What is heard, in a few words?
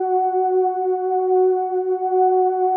505, String Machines